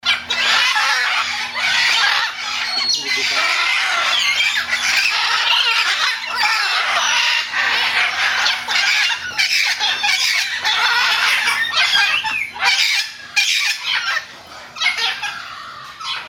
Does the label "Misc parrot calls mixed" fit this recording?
Yes